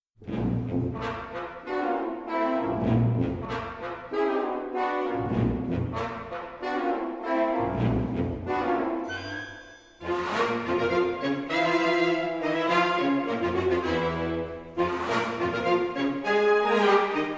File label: Concert Band, Scherzo